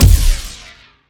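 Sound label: Laser gun Flange Cannon Fire; Lasrgun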